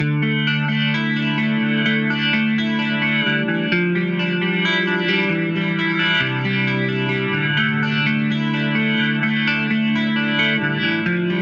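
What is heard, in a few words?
British channel Leslie guitar EQ comp; T-RackS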